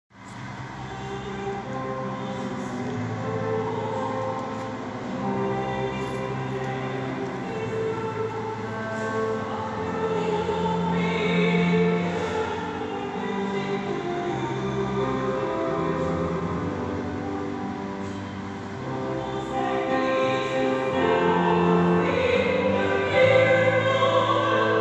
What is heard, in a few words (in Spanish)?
ALELUYA SOPRANO PIANO